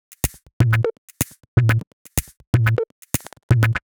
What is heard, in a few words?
Top 124bpm, House Drumloops